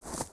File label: Pet Cat Dig; creatures pets